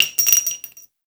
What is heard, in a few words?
Shell; Dual Vipers; Weapons